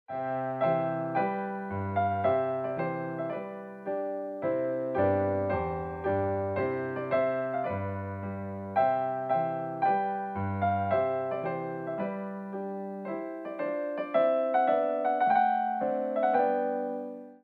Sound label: Trumpet Tune Fast; Purcell